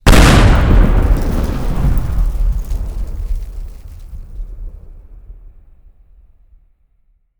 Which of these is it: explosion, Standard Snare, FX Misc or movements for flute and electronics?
explosion